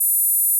Gauss Filt A5

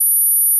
Gauss Filt F#6

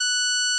Odd Increase F#6

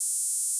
Gauss Filt E4

